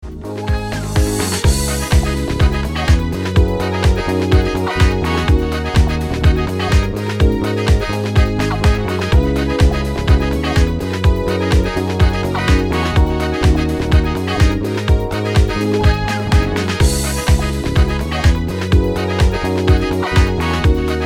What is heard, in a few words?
disco